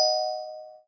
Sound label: Oneplus Message Tone